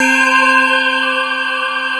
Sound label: ATMO SYNTH